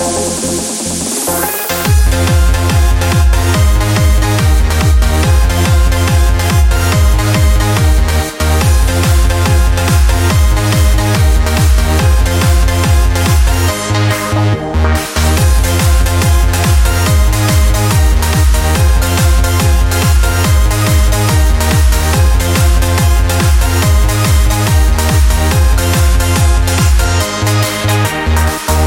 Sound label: Electro